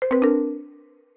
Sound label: music motif revive